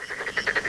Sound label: bump; quake2; raptor